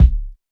boom steel kick drum d key, bass drum sample tuned to the D note